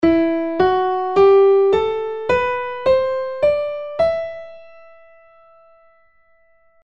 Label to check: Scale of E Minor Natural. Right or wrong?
Right